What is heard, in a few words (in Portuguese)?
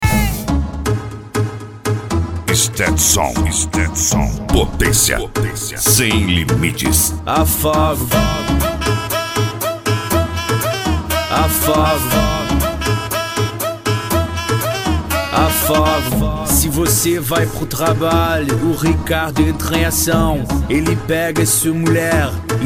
sound car carnaval